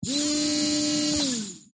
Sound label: Science Fiction Servo Motor; Spacecrafts